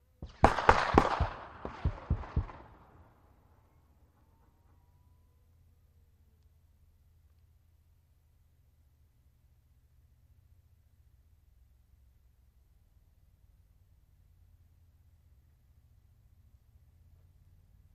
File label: .50 Caliber: Bullet Impacts From Distant Firing.